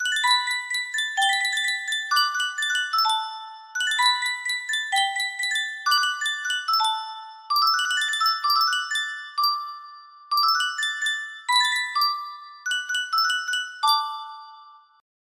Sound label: sankyo custom tune music box